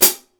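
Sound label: HI-HAT PEDAL; Greytsounds; HI-HATS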